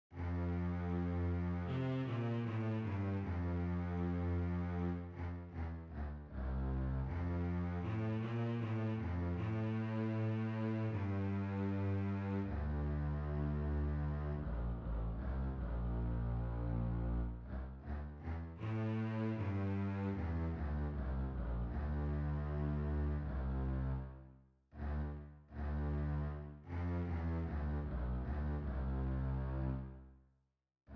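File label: string bass slow; middle school; Region 23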